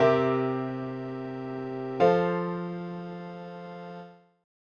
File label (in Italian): Settima dominante